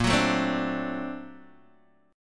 A sharp Augmented Major 9th A sharp, Guitar DADGAD; x,1,4,2,3,0